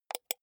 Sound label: computer mouse wheel click